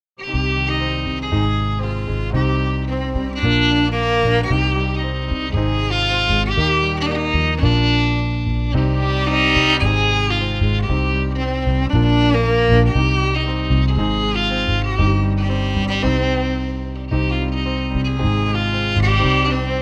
FIDDLE PIANO; Jink & Diddle Book o' Tunes 2022

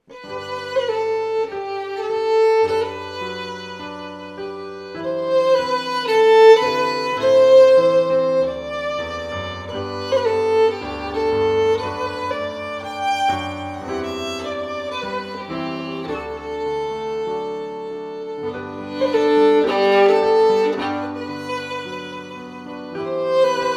FIDDLE PIANO; Jink & Diddle Book o' Tunes 2022

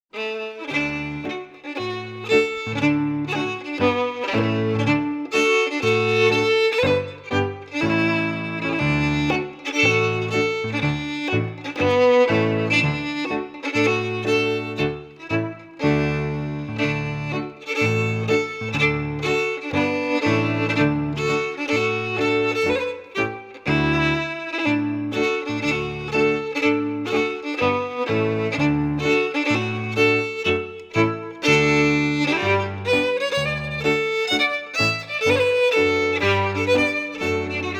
FIDDLE PIANO; Jink & Diddle Book o' Tunes 2022

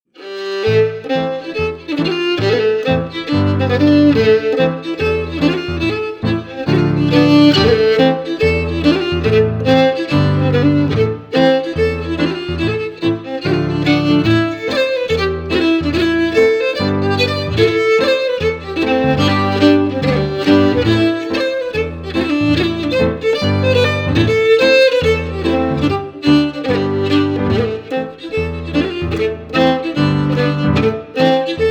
FIDDLE PIANO; Jink & Diddle Book o' Tunes 2022

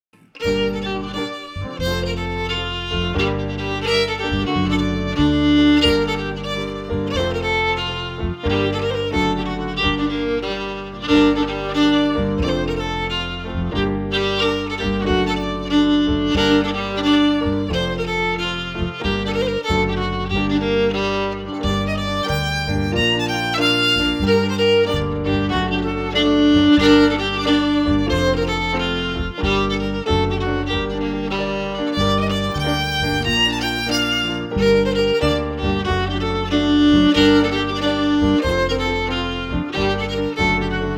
FIDDLE PIANO; Jink & Diddle Book o' Tunes 2022

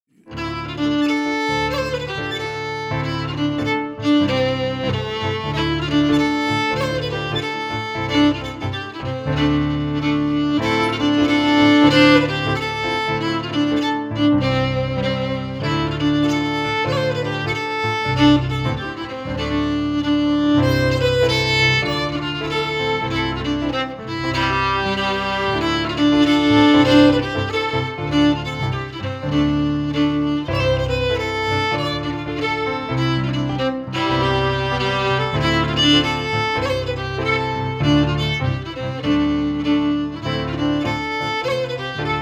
JIGG FIDDLE PIANO; Jink & Diddle Book o' Tunes 2022

